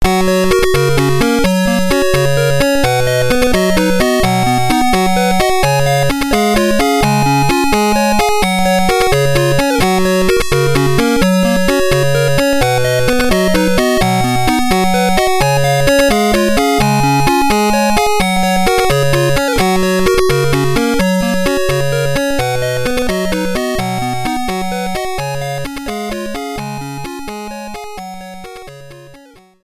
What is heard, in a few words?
Win Theme; Battleship; GG